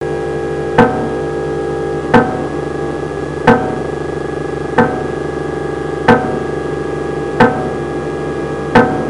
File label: microphone test tapping